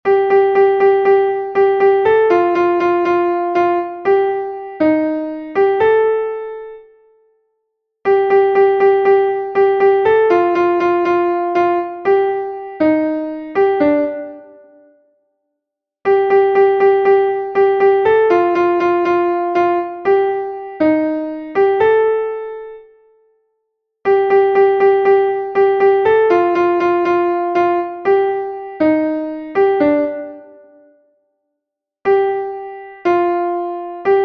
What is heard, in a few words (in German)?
g moll hoeher